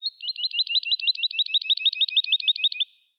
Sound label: moving bird Lark